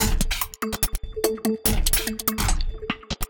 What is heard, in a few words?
145 Dance Combo Amaj; Dance DJ; Pro Tools Essential Loops; Digidesign